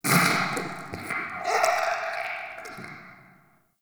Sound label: Interior Goop Distant, Infested, Ambience; Misc Indoor